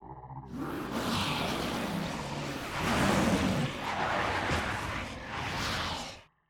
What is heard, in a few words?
cerberos idle, monster